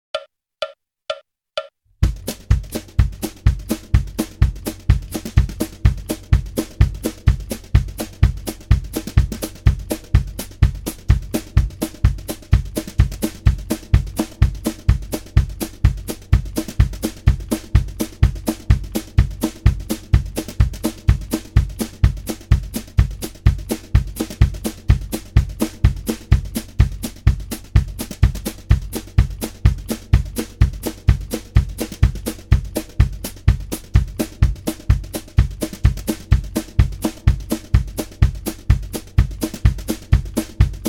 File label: Country Rhythm 126 bpm, Guitar; Guitar Aerobics Disc 2